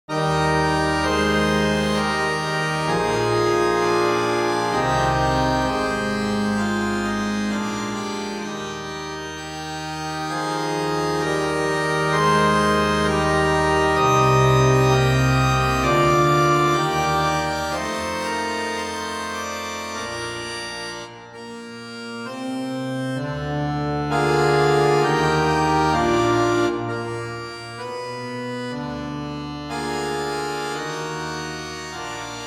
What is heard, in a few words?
Grand Choeur Eb, harmoniums; P Posthumes; Franck